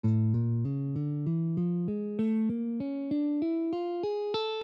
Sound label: A Gypsy, guitar